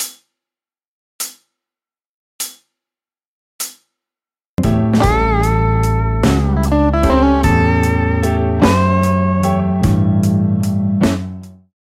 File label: Modern Blues Licks